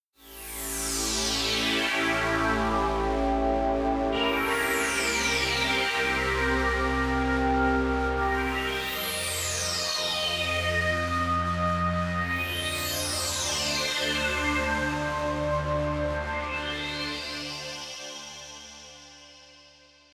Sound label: spatial sweep pad